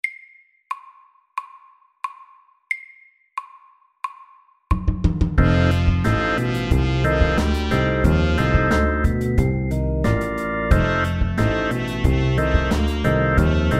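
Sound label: 12 bar blues, slow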